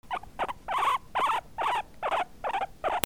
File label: ANIMALS